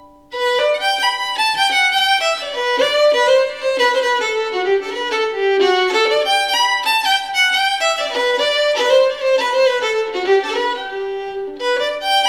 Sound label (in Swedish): Polska, Harjedalen; Polska e Anders Moberg Härjedalen EÖ642